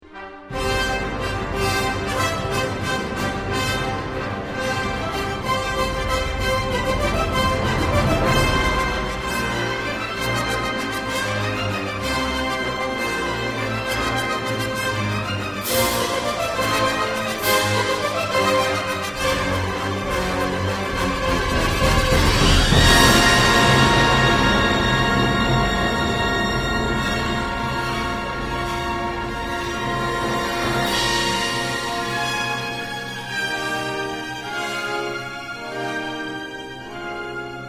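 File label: Trumpets & Trombones; III - In ruhig fliessender Bewegung; London Symphony Orchestra; Mahler Symphony No. 2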